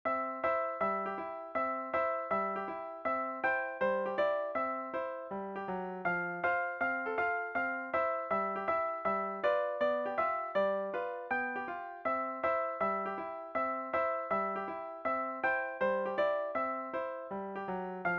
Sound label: Country Jingle Bells